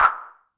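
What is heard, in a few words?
Hand Clap Analog; YAMAHA RM1X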